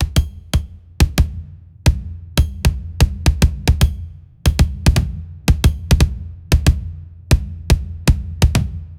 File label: intense drums or fast pac; soundtrack